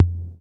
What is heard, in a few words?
KICK, Hip Hop; HipHop Kick Snr Hat; PlatinumPhattCD1; E-MU Producer Series Vol. 8 – Platinum Phatt